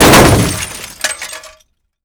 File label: car landing; Modern Warfare